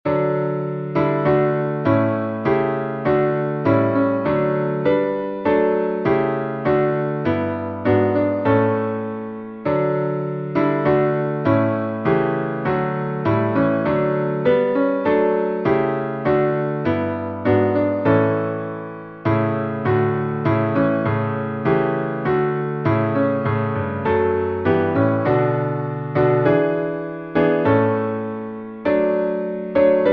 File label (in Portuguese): salmo playback